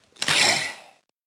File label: adlet angry, utterance